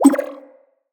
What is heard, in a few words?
Thank You Bubble, UI; Blessing Device Menu